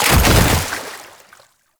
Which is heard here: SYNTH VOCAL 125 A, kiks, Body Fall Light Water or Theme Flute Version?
Body Fall Light Water